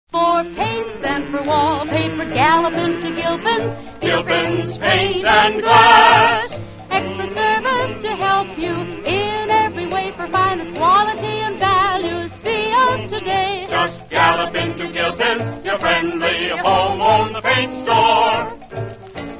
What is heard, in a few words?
radio jingles; gilpins paint and glass; allied radio artists